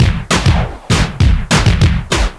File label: Industrial